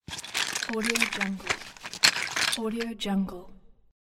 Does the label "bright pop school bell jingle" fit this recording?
No